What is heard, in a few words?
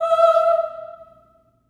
STACCATO E5, Symphony of Voices; Spectrasonics